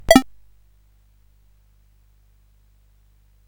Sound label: sid CSG8580R5, GAMES; Card Sharks